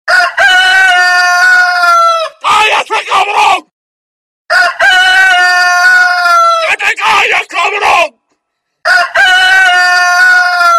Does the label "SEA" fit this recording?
No